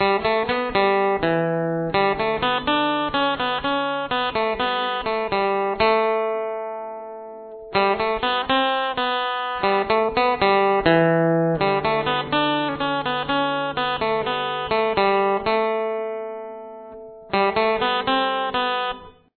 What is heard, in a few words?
lead